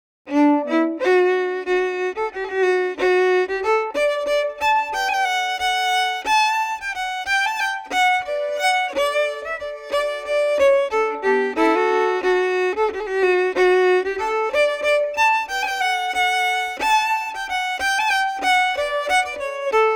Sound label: pete s march 2nd time harmony, fiddle